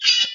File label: equip